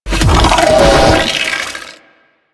Dive Bomb Attack; Enemies; Abilities; Sentient Brachiolyst

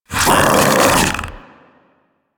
Infested Splitter Joined Vocal Attack Medium; Warframe; Grey Strain

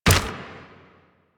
Proto Infested Runner Step Default; Warframe; Steps

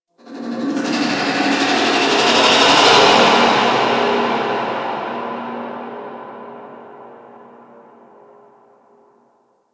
Ambience Hub Red Veil; Warframe; Tenno

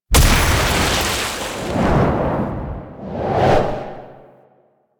Queens Chamber Blast; Warframe; The War Within

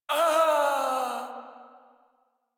Death, Barks, Battle; Warframe; Baro Defense

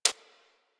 Bird Of Prey Feed Ground; Characters; Warframe

